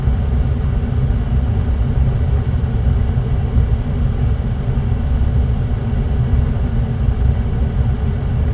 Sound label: office garage vent; ambient; machines; Counter-Strike Global Offensive